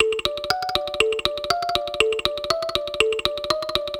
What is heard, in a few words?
Time Attack loop; Slinki